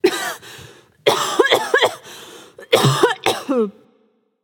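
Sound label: clear throat, female; smite; voicepack; hera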